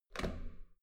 metal door heavy handle up

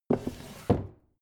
book shelve

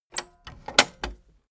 tape deck close clatter

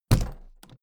suitcase put down hard

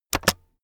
keyboard external space press fast heavy

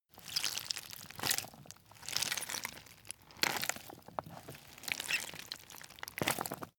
intestines handle

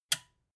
metal button release power off

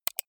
computer mouse right click fast

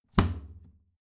plastic trash can close heavy muted

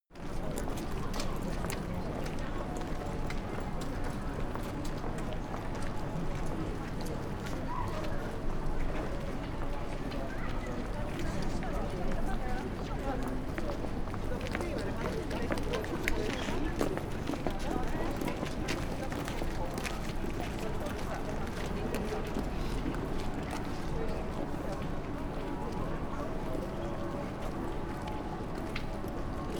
city winter germany crowded close

